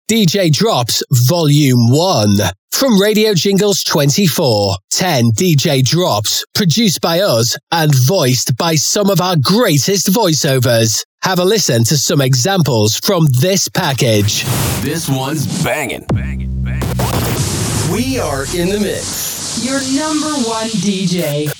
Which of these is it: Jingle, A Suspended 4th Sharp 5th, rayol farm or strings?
Jingle